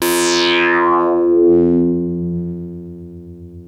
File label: Arp E F2, ARP Odyssey; musicradar-synth-samples